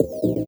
Eb STAB, funky

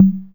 Toms

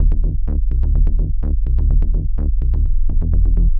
126 E BASS, Funky; Loopmasters; Funky Underground Vol 2; BASS LOOPS

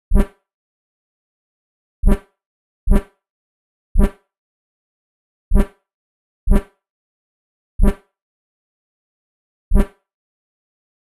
125 G Bass, Complex Electro Synths; Prime Loops; Explorations

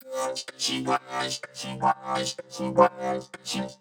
126 C VOCAL LOOP, Funky Underground; Loopmasters; Funky Underground Vol 2

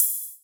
Ride, Cymbals

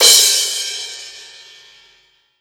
Crash, Cymbals

